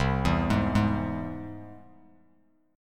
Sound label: C Augmented down Bass Standard; arpeggiated; JGuitar